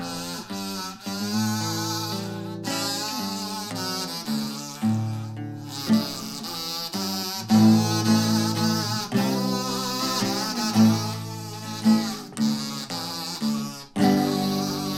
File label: kazoo + guitar stem; PledgeMusic